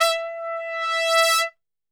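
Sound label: E 3 trumpet, Brass Super Section; Best Service; TRP SWL; 90 s Sample CDs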